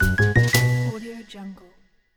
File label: easy jazzy win